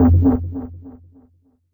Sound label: deep hit, Drum N Bass; Sonic Foundry (Sony Creative Software); Knutrix Drum & Bass